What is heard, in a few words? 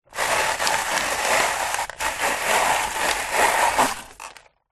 paper shredder manual handle turns shred paper; office